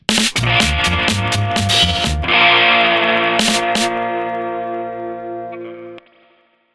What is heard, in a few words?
Rock A billy Like a 50s Radio